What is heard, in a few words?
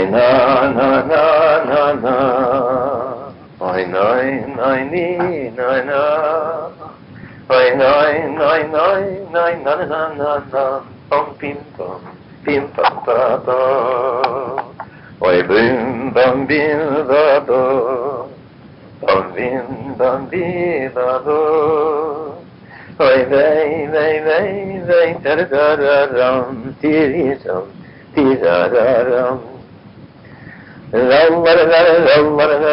niggun